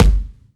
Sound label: kick oneshot, Pop; trunk; Slate; SD PASADENA Pop Sample Pack